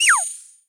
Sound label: pixie death; Crypt of the NecroDancer